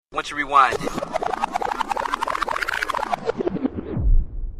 scratch

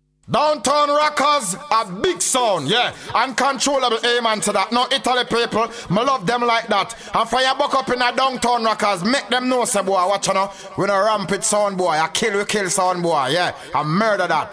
jingle; DOWNTOWN JINGLES

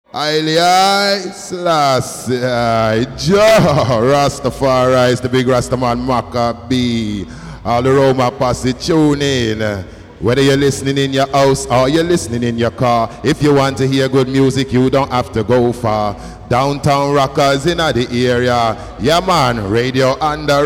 jingle

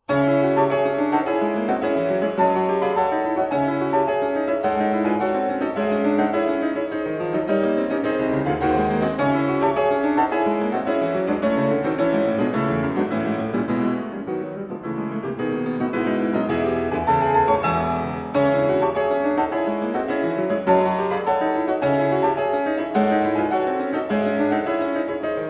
Symphonic Etudes, Piano; Schumann

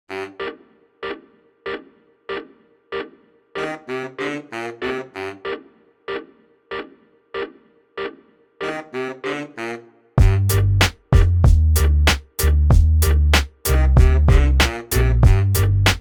Freestyle Rap Instrumental Beat

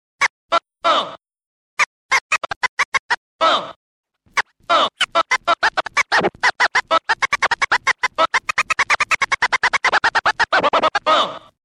scratch

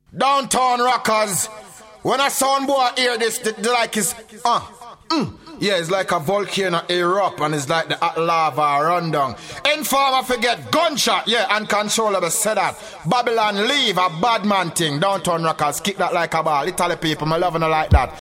jingle; DOWNTOWN JINGLES

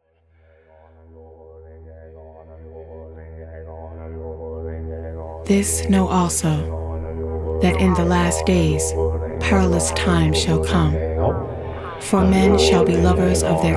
Chant

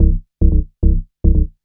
Bassline

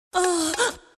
augh orgasm

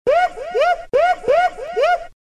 Uh Uh, dj tools

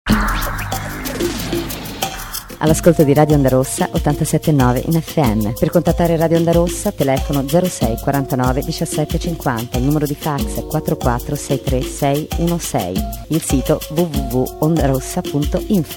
jingle uauh

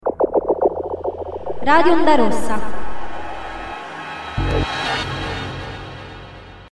Woosh, jingle

